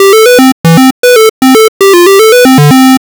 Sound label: startup, c64; flip flop